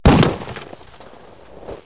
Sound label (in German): Stein